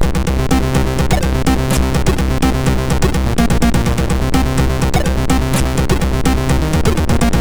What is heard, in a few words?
Unused Shmup Ready Game Over